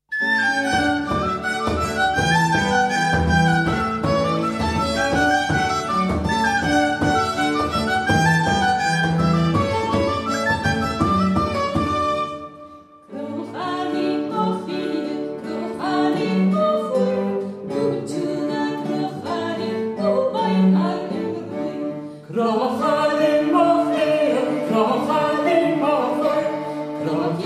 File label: crodh chailean Live